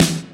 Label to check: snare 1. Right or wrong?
Right